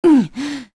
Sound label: Vox Attack; Pavel; kingsraid